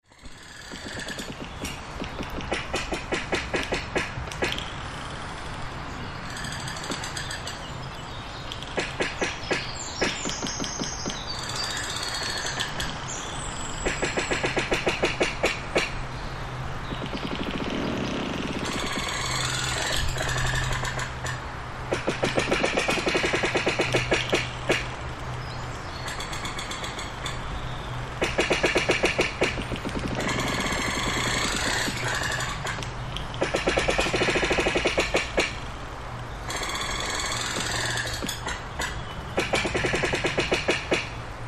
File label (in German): Baum Knarren